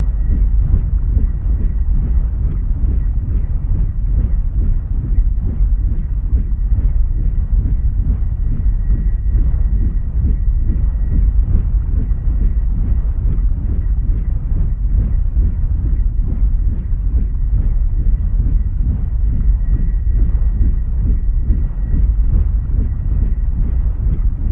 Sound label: Propeller Water Whoo; propeller movement